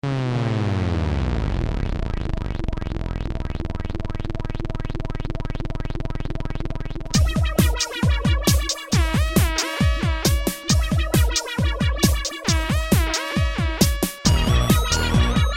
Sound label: seq, korg ms20 synth